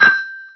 coin

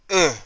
grunt, voice; Tribes2

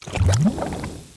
lava bloop; environment; Tribes2

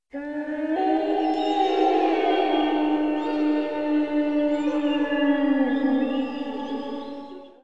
yeti howl; environment; Tribes2

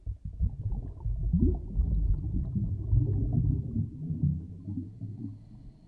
bubbles, environment; t2-mapper

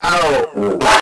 deathcry; Tribes2

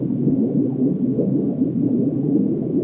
bubble trail; Tribes2

